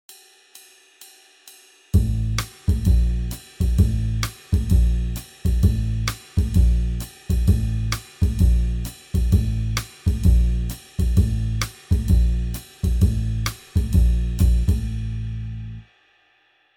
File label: Jazz backing, Guitar